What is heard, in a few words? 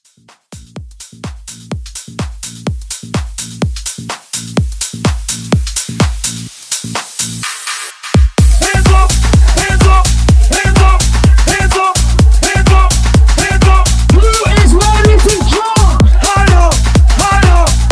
House Mashup 126